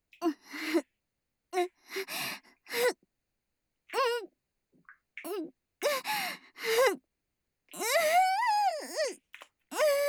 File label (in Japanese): 喘ぎ（SEあり）喘息